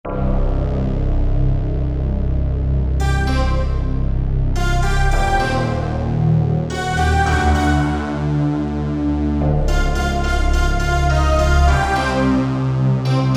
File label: 2 voices; Korg MSC-12S Synth 3